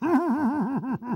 Pyro laugh evil

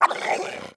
throwable attack

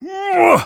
Spy taunt exert

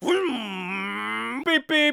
motorcycle; Scout

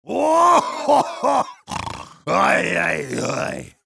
Heavy laughter big, de

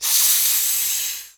demoman jeers, fr; Réponses du Demoman

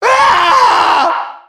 headbomb hit

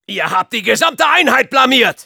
jeers, de

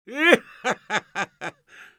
laugh evil; Engineer; *Risa malvada 2*

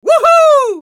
Scout battlecry, es; ¡Wohoooo!

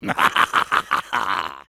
laugh evil, fr; Rire méchant 1; Soldier

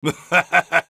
heavy laugh evil, es

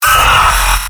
pain sharp; scout

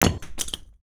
grenade drop; demo nuke taunt

